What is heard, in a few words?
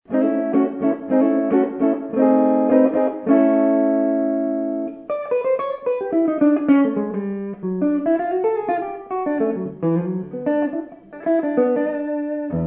black EG1 neck, guitar; Standard P-90 Neck